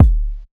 KICK; SMACKINTOSH

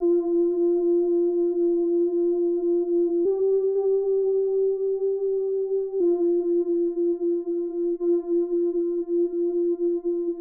Synth

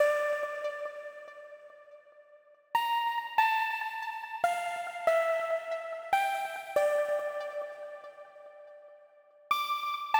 Drill Melodies Dmn 142bpm pluck; Drippy

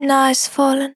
C Fem Voc Dry

